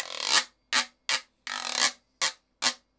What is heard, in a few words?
guiro 80 bpm, latin